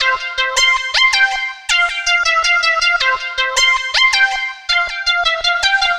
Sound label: Dance Synths, Hardcore Loops, Synth Loops